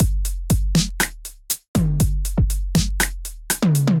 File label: Kit, drum kits standard; Fairfax Kit; Ableton; Factory Packs; Drum Essentials